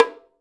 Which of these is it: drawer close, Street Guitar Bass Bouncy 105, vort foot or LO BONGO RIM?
LO BONGO RIM